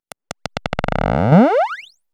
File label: chafing; sound effects